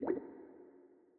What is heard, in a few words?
zone gas blop